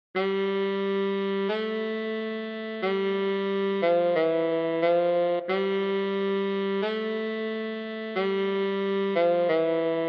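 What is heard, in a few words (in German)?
O du fröhliche, Tenor Saxophone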